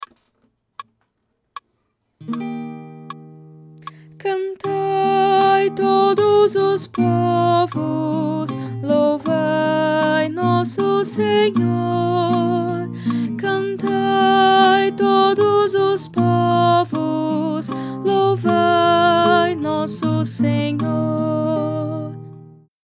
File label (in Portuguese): Soprano